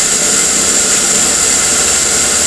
steam hiss loop; rvgl dcpack; roof

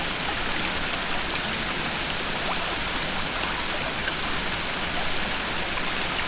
lawnmower

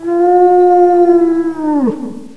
digger

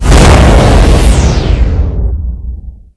shock; pod skyrace

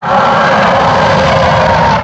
dragon

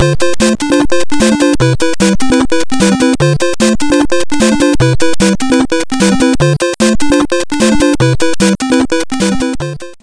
electro

